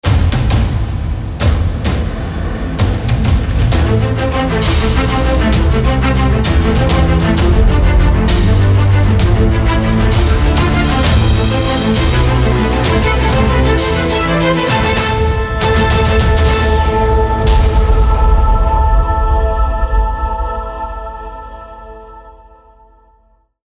Epic Power Logo

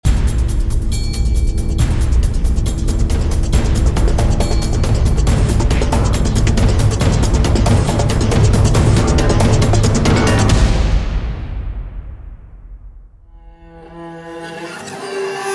Epic Action Trailer

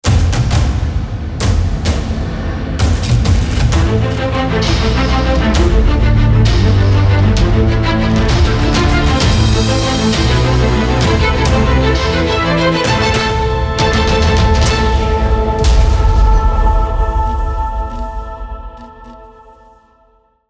Epic Power Logo, With Electric FX

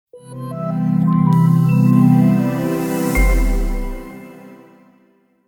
Elegant Logo

